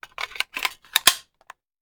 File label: Machine Gun Foley; Sound Ideas; Guns